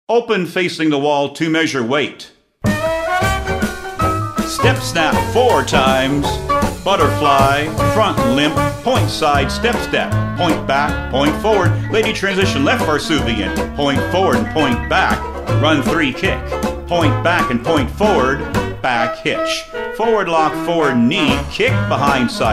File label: vocal sample